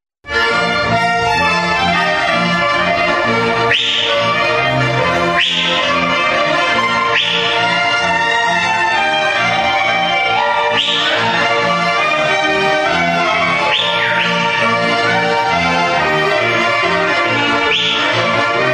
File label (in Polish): KAPELA polka; JODŁOWIANIE